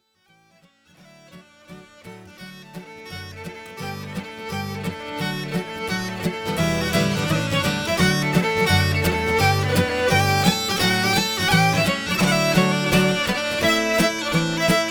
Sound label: Small Pipes